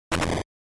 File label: Fighting Fall